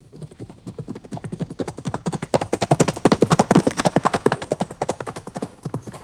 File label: horse galloping past on soft grass; footsteps